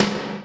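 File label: Snare Lo Brutal, Alesis D4 drum machine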